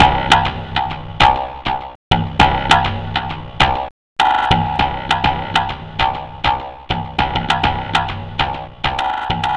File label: Chunky Beat; Electronica; Composite Beats